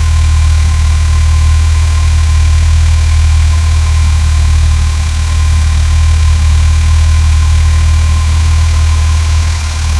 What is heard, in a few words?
electric car driving sound